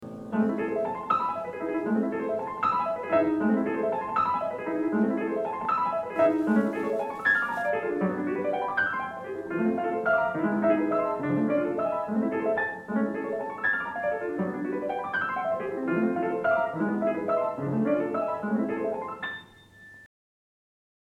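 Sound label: Variation VI - Poco più vivace; MICHELANGELI; BRAHMS; Variations on a theme by Paganini, Op. 35; Book 2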